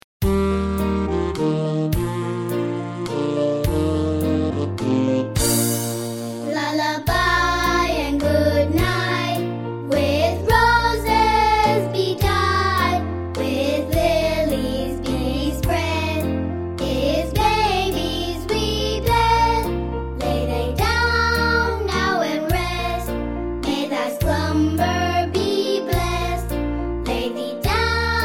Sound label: Nursery Rhymes Lullaby and Goodnight